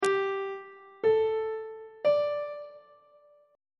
g suspended 2nd triad chord intervals on treble clef